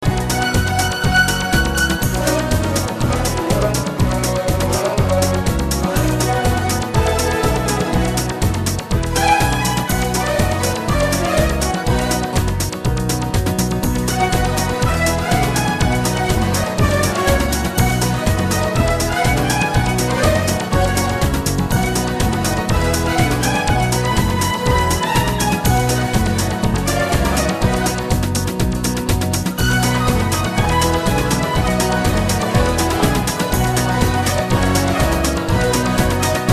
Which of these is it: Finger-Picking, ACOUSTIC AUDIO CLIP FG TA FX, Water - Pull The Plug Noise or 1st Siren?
Finger-Picking